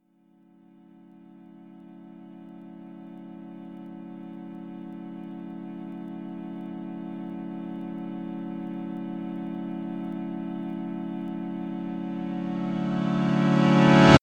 Reverse D Chord Long

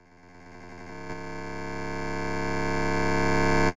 Spongeous Buildup

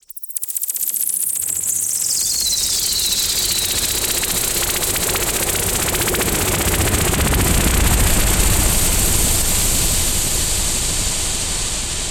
Sfx Wet Sweep Fx

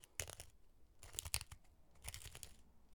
Crunching

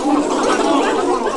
Cartoon Laughing Together

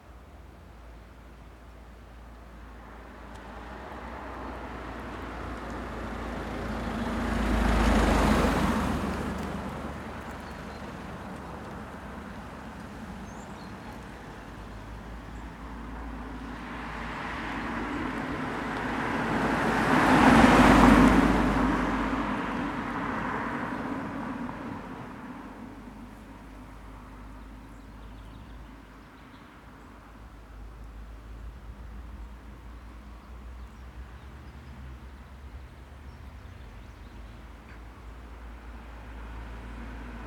Passing Traffic On Country Road

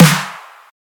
Brostep Wide Punchy Snare

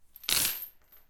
Chain

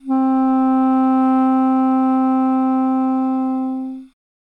Duduk C3

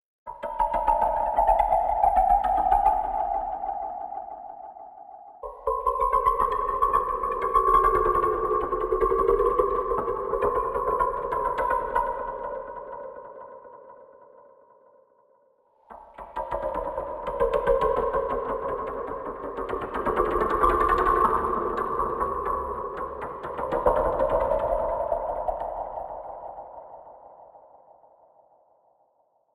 Cello String Hits

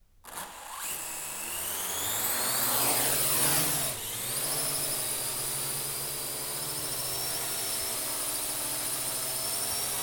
Drone Quadrocopter Flying Close

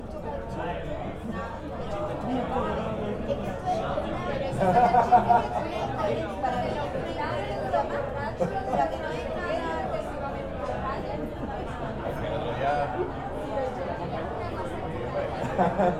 In Music Bar

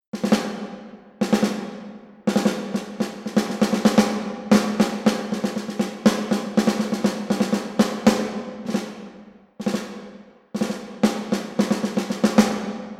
Warfare Snare Drum

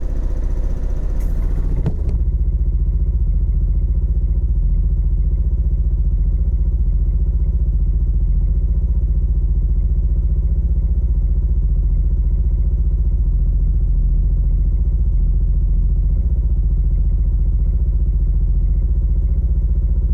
Sounds From Motorbike S Trunk

